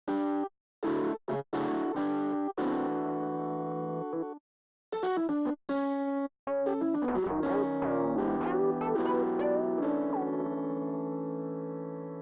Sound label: piano jingle; busman's pianojingle